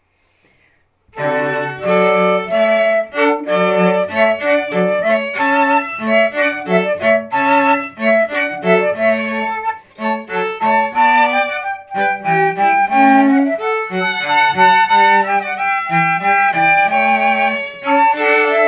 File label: Hornpipe